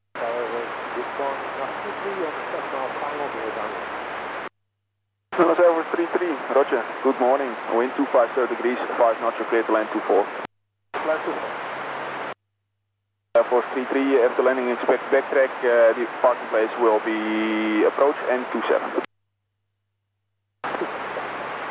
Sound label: airforce arrival; netherlands airforce 33